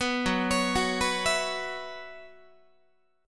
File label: Gb 7th Suspended 4th Gb down Guitar, chord; Gb7sus4 arpeggiated